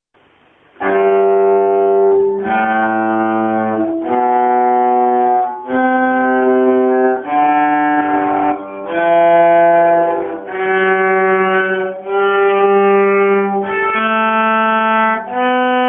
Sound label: Vc G major